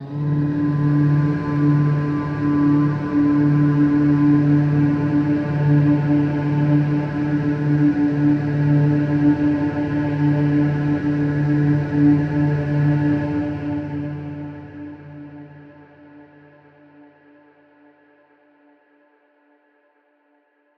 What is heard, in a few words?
Pad, PsyTrance